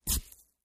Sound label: Flesh Stab, gore stabs; gore stabs & eat